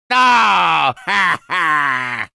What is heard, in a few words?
Disappointed; Wario; Mario Party 8